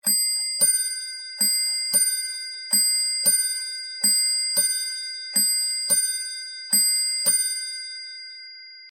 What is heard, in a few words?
lg Bell, hermle kaminnye chasy; hermle 22876; interernye chasy